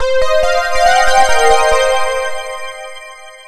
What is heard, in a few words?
easter found; MBHaxe